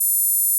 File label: Gauss Filt A5